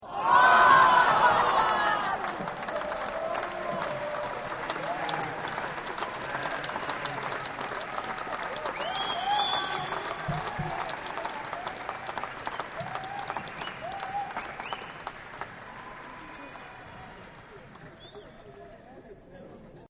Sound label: Applause OOhhhh; Applause Oh Yes; Human